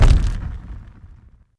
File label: technician hit; propack